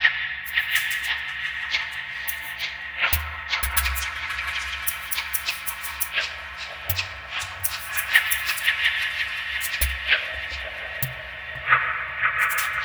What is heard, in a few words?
Other Perc; SFX & One-Shots